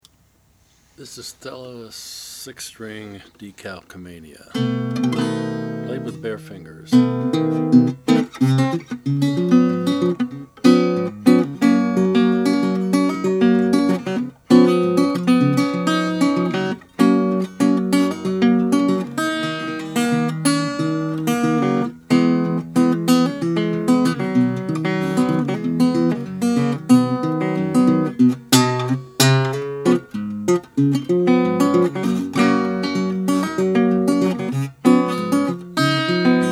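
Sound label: stella decalcomania sunburst, Guitars; 1935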